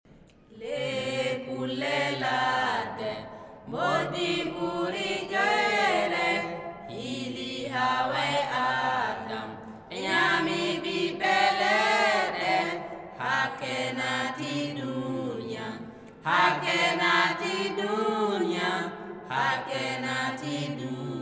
Fulfulde Northeastern Burkina Faso Sin Enters the World Song; Salaare Aadama e Haawa